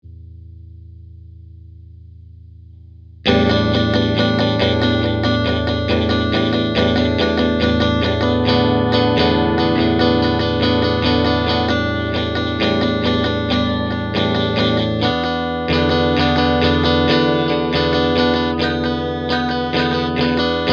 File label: fender, practicing on a fender stratocaster